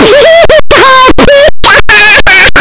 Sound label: pikachu cry; pokeyellow